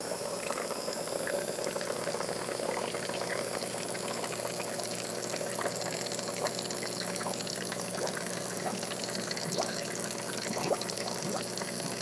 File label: coffee maker percolating and bubbling